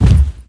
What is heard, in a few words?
foot land; smite; zhongkui